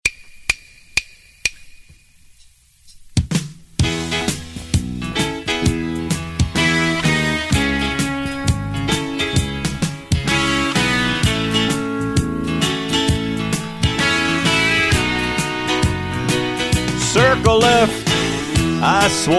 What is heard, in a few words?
vocal